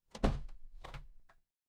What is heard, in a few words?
door open